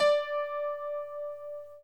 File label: High Piano